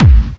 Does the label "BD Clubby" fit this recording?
Yes